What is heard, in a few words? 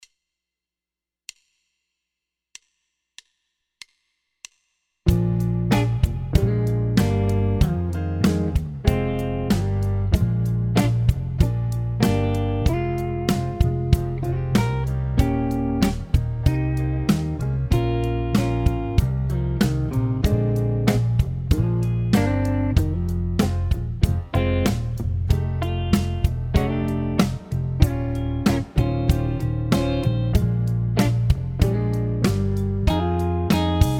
blues